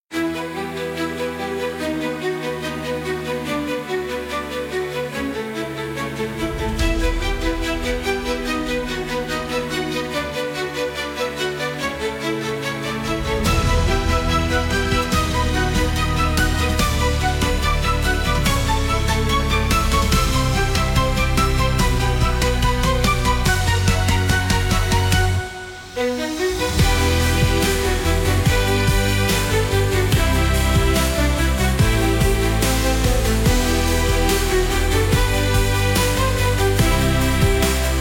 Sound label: epic rock, instrumental